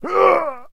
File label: death